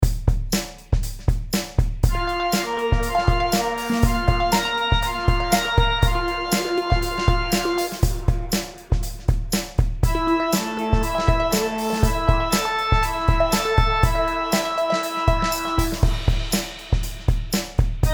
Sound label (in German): Naturtonbindung in vierteln